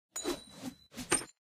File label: turn; Evoplay; Heads Or Tails